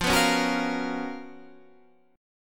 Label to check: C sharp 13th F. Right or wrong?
Right